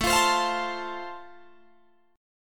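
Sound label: A Minor Sharp 5th, Guitar Open G, chord strum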